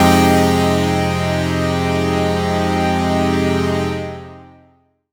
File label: stab toobig, stabs